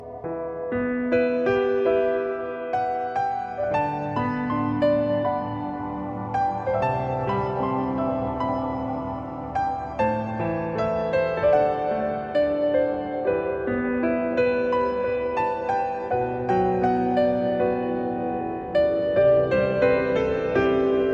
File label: Grief Piano